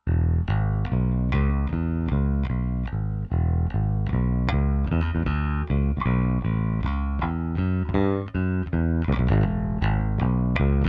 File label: NUANCE, Zoom 506 II